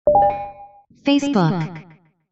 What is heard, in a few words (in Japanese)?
facebook 通知音